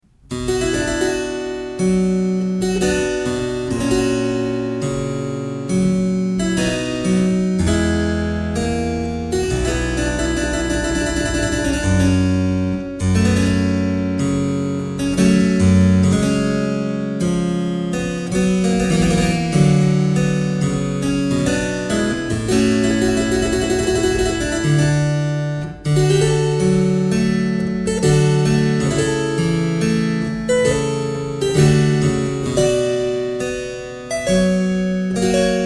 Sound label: Ruckers (primo 8'), Sarabanda; Louis Couperin